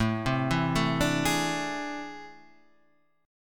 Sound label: A Minor Major 11th, down, Guitar, Standard; arpeggiated; AmM11; JGuitar